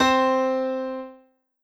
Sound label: unison